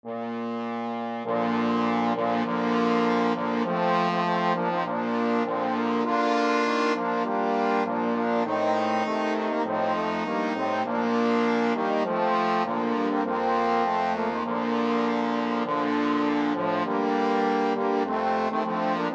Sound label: trombone quartet